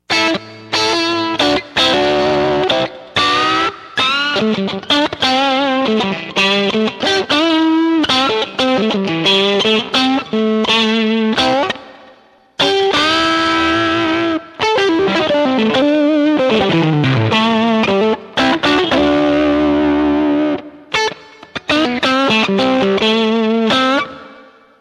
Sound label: screamer, pedals